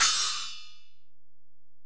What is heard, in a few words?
Ride, Alesis DM-PRO